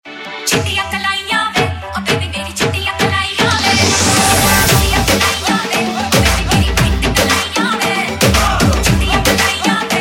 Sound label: DJ Dhol Mix, Bollywood Punjabi Pop